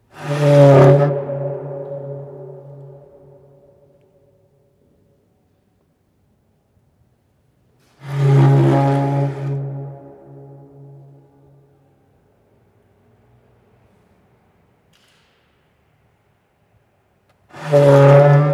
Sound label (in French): eglise grincement prie dieu